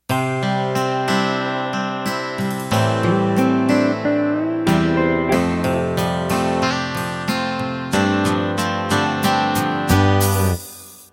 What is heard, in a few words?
Country Ballad Intro